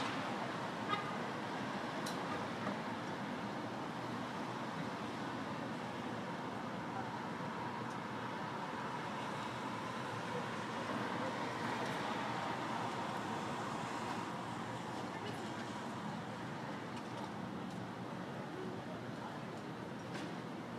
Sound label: Wind